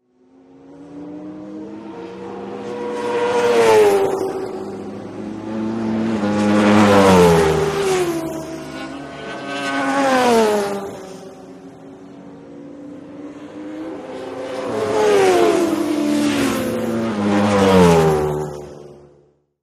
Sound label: Motorcycle Road Racing